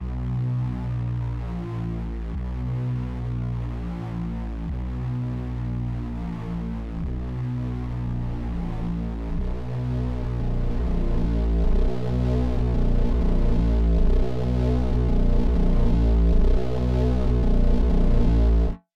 Pulse Bend C 128 bpm 5 4 Remix; Dean Valentine

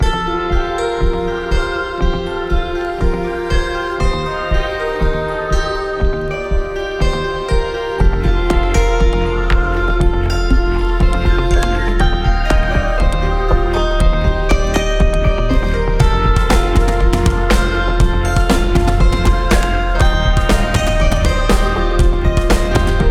Bandura

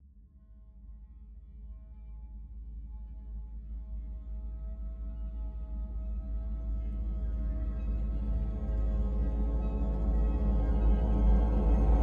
Glassy Shards Riser G; MALONEY

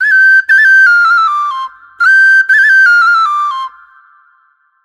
Sopilka

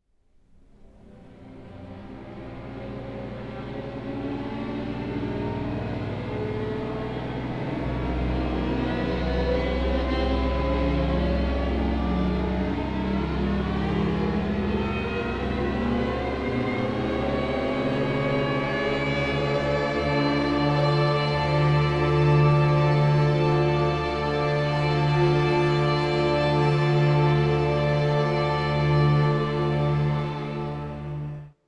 A Long Orchestral Intro Dm 70bpm

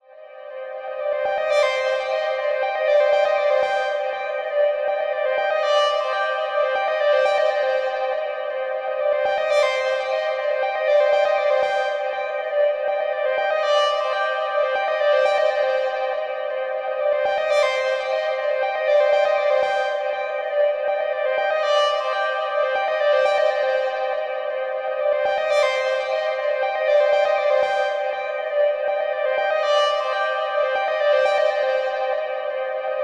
Arp Cm 120bpm; LiveWire

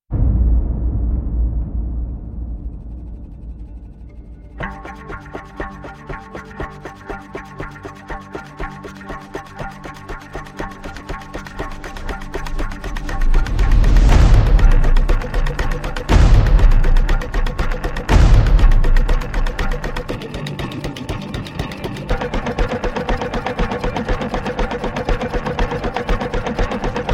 uke

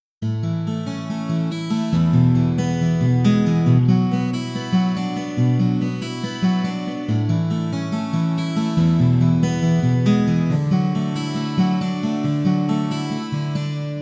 Combination Two steels; Fingerpicked Guitars; Marcos Ciscar

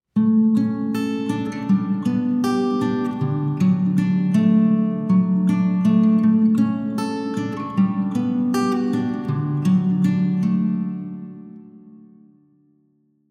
Guitar St. Andrews Church

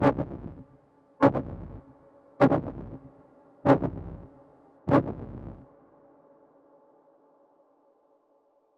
Moog Angry C 100bpm

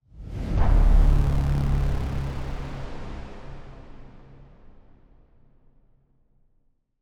Braam D; Ancient Headroom

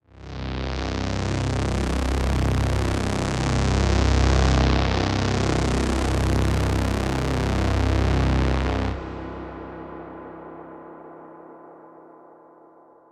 Moog C; A Moog World Order

